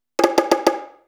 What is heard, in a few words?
Fill 140 BPM